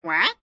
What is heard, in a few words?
duck question